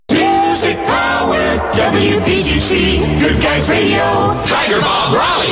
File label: jock jingle music power